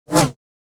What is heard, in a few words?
Saber; FXHome